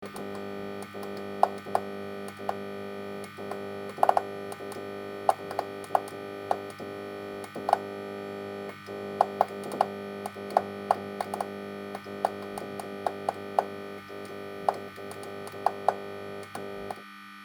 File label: Neon light buzzing and flickering